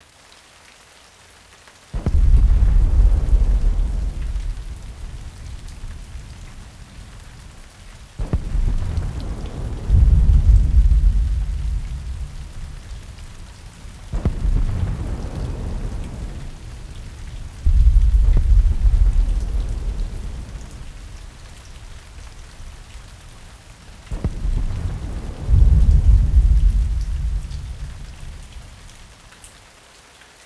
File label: rain War; Terminator 3 Rise Of The Machines; XBOX